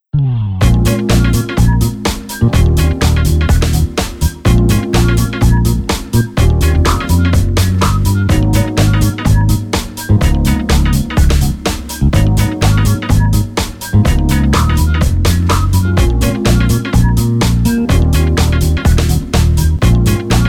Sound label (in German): telefonansagen musik funky lounge